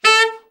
TENOR, SAX; Best Service Gigapack 1

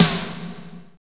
synth snare; Greytsounds VOL-1; PROCESSDRUMS

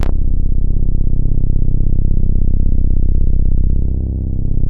BOUNCE, BASSES

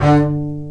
Spicato Basses & Celli; OMI - Universe of Sounds; EII Factory Library

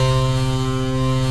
RATTH; E-MU Producer Series Vol. 5; 3-D Audio Collection; 3D Multis; 3D Sprint Select 1

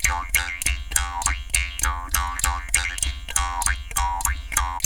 JAW GROOVES, Didgeridoo

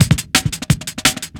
breakcore breaks; rusty nail

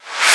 Reverse FX

